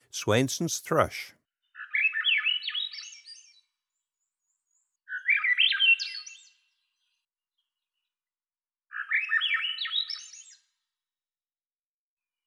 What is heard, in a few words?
Swainsons Thrush